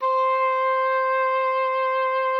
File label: OBOE C5; Xsample VOL-6